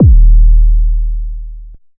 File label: Kick Sub; One Shots; Drums; Abyss